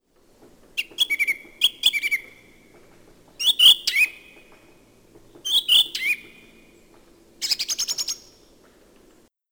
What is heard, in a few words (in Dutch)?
Zanglijster Turdus philomelos; fluiten